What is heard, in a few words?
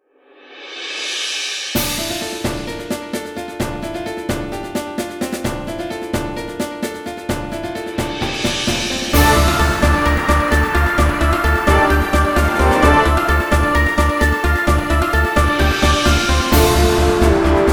Pump Up The Ship orchestral arrangment